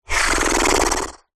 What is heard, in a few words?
animals horse snort human; zapsplat